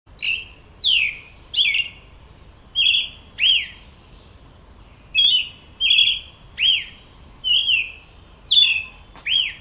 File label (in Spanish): zorzal